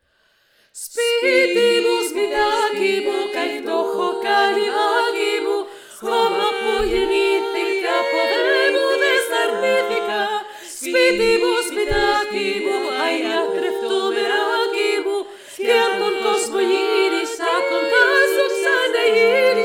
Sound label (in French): toutes les voix